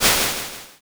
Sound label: swoosh